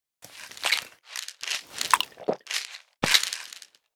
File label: pills use; STALKER; IWP; item usage